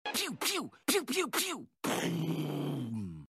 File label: pew pew